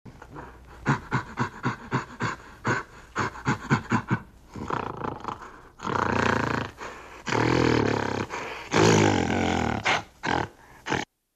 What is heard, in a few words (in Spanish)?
perro boxer